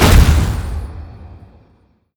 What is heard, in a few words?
fire; skyrim; elemental